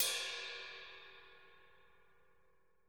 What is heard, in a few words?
RIDE, drum; 90s sample CD